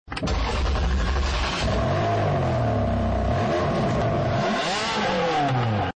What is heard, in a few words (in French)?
Ferrari 360 Challenge interieur